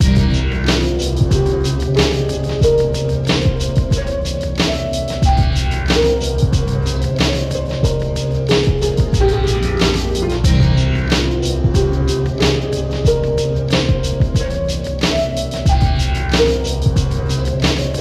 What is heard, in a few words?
Dark Beat